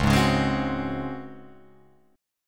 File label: C sharp Major 7th Suspended 4th C sharp Guitar Open F; C#M7sus4; strummed